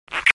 kiss

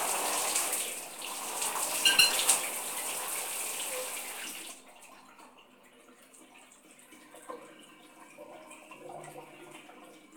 shower end, house

